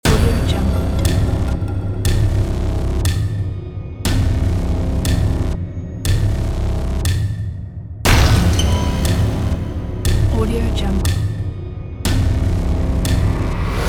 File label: nightmare countdown cinematic